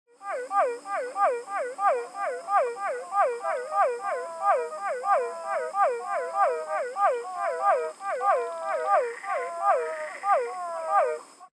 Physalaemus cuvieri

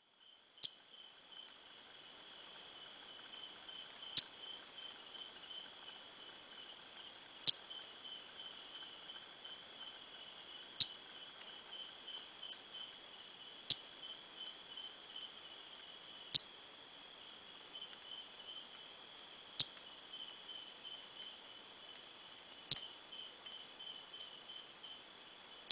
Vitreorana uranoscopa

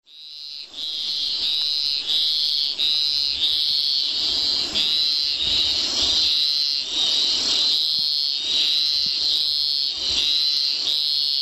Scinax fuscomarginatus

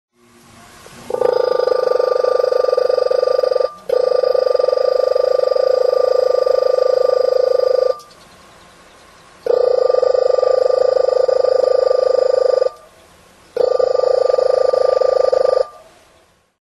Scaphiophryne boribory

